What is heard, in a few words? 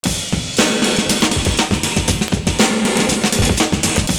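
DEEP reverb, LOOP; Univers Sons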